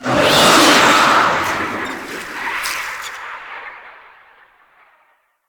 Infested Ancient Death; Characters

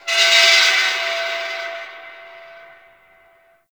High Metal Screech; Warframe; Tau; Ambience

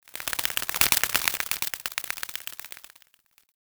Forest Butterfly Flaps; Warframe; Grineer

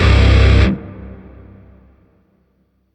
Shawzin Maj Chord; Music; Warframe; Grineer; Chords

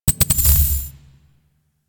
Corruption Pickup Hostile Large Activate; Warframe; Missions; Zariman

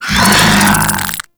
Desert Skate Vocal Attack; Warframe

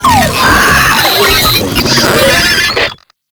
Proto Infested Brachiolyst Idle, Vocals, Enemies; Warframe

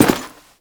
Step Default; Characters; Warframe; The New War; Veso

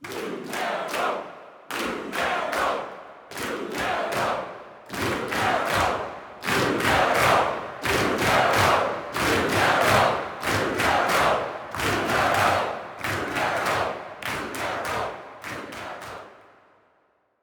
Crowd Chant; Lunaro

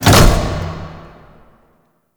Mine Stop; Warframe; Grineer Galleon; Counter Intel

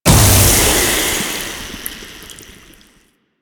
Infested Ancient Toxic Ground Blast

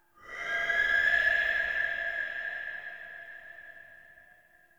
Interior Texture High Screech Calls; Ambience; Indoor; Warframe; Infested Eris

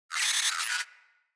Space Drone Death; Enemies; Corpus

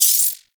Glass Shotgun Shot Prime Layer C; Warframe; Tenno

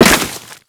Crewman Step Dirt, Footsteps; Warframe; Corpus

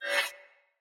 Sword Whoosh Accent; Fey Sword

